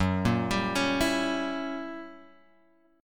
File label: F sharp 7th Flat 9th F sharp down Guitar; arpeggiated; JGuitar